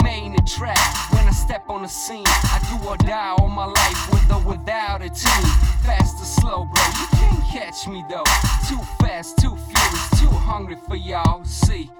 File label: Heavy Weight, free loops